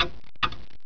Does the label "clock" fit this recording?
Yes